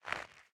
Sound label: armor; smite; ahpuch skin10